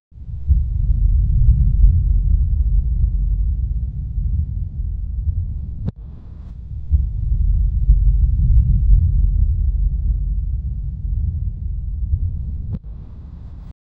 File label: distant echoing cry of a; animal cry